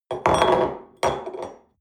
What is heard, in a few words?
espresso upset table hard, restaurant cafe